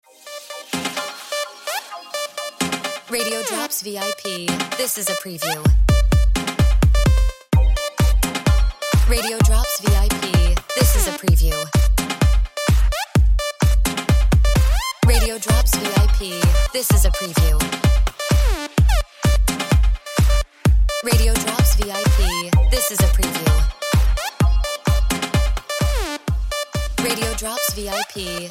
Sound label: Music Beds